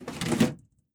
SFX case Attach Metal; PC Building Simulator

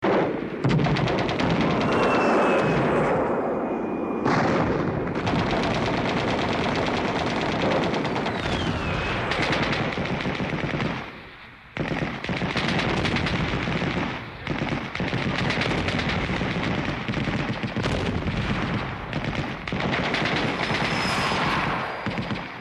Ambient Distant Battlesound Loop

